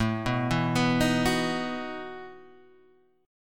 E Major 7th Suspended 2nd Suspended 4th

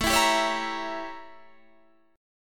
A 7th Flat 9th; A7b9; strummed

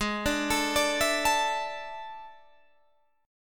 A Major 7th Suspended 4th G sharp

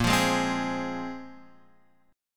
A sharp 7th Suspended 2nd, guitar chord; strummed

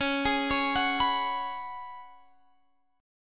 Db 7th Suspended 4th Db down Guitar Standard; arpeggiated; chord shape; JGuitar

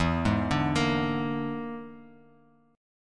E 7th Flat 5th, down, Bass Standard

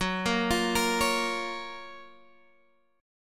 F sharp Major 7th, guitar

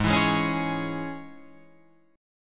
Ab Suspended 4th Sharp 5th Guitar Drop D

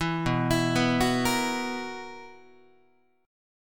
B Major 7th Suspended 4th down Guitar; BM7sus4; arpeggiated; 7,7,7,9,10,9

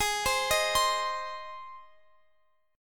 G sharp Major, Guitar, Open F; arpeggiated; JGuitar

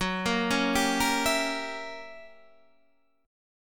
Gb 7th Flat 9th Gb down Guitar Standard; arpeggiated; JGuitar

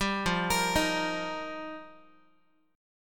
F 7th Suspended 2nd Suspended 4th, Guitar; F7sus2sus4; arpeggiated; JGuitar

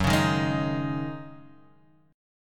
Db Suspended 2nd Suspended 4th Gb, chord

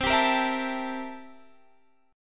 C 13th C

